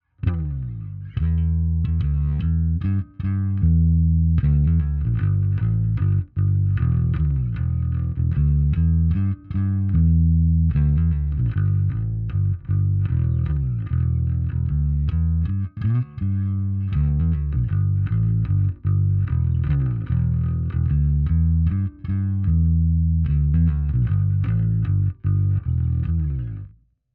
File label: kombi SVT410E sm57